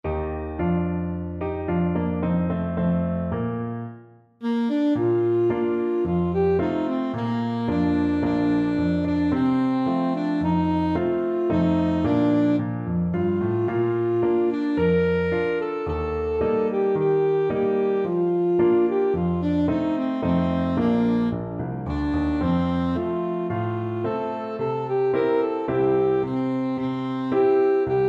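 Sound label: alto saxophone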